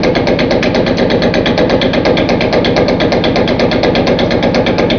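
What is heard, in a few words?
ambience industrial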